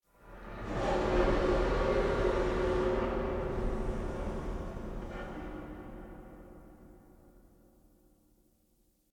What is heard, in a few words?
Steam Blast E; Warframe; Ambience; Spawn Rooms